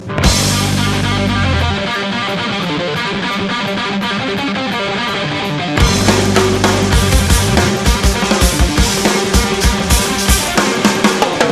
liquid chillstep

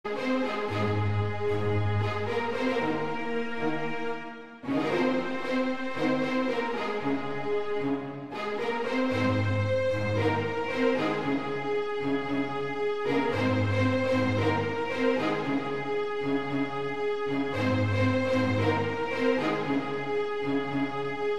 Orchestral

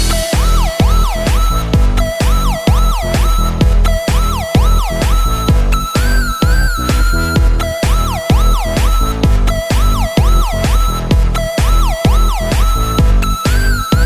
Police Electro

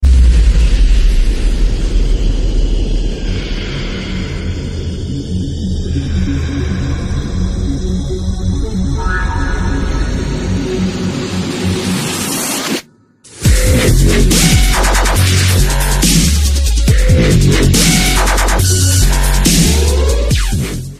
Dubstep

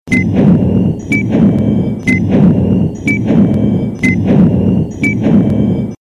time ticking; ringtones

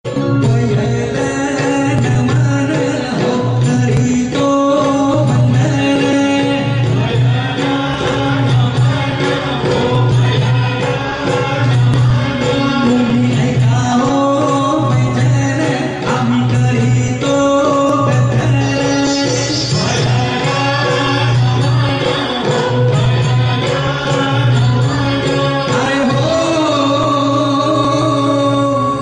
ganpati marathi arti

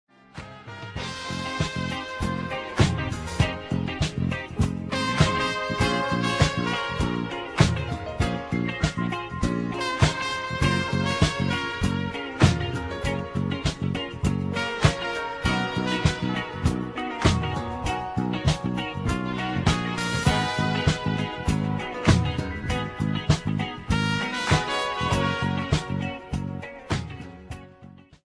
instrumental

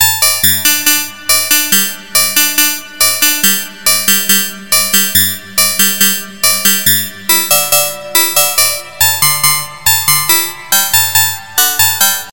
Synthesizer

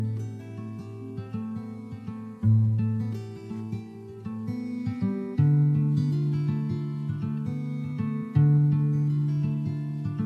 relaxing guitar

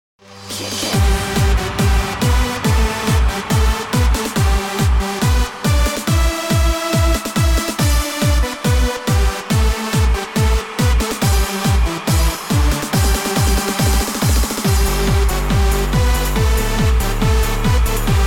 new years ringtone

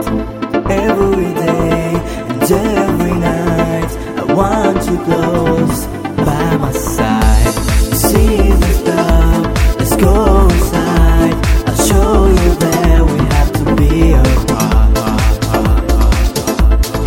club house, dance

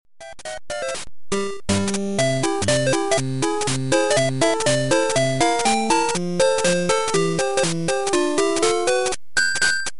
8bit; Mario Land

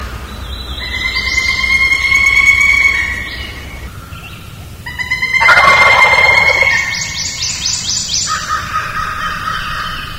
turkey woodpecker; animals

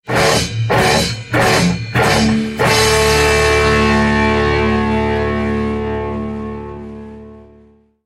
drum hard; dance

